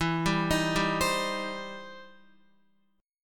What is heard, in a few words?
E Minor Major 7th Sharp 5th E down Guitar; EmM7#5 arpeggiated